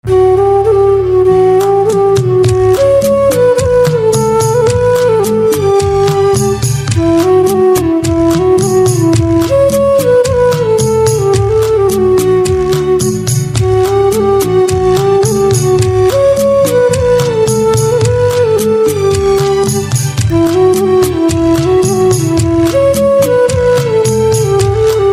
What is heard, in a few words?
Flute